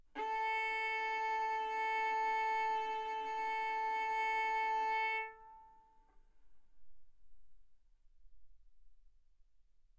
Violin arco A4 B4; mf; sul A; stereo

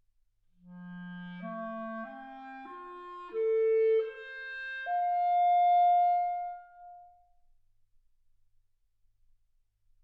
clarinet

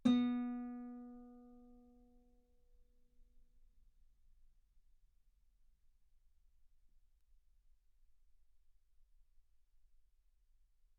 Guitar B3; ff

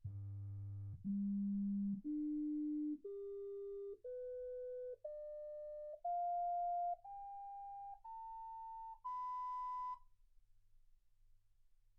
sinus tones 48kHz

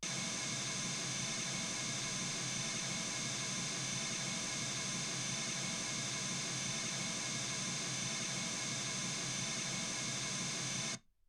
mls 48kHz

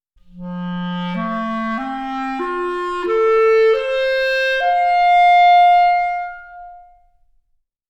clarinet

